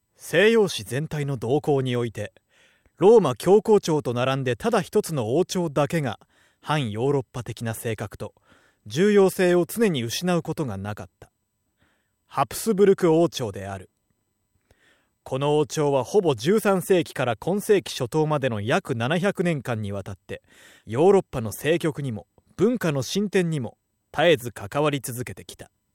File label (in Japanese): sample 落ち着き